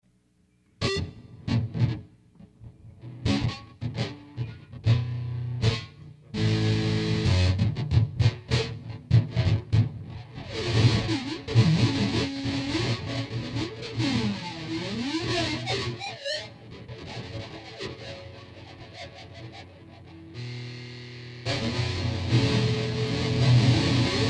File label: Guitar Prelude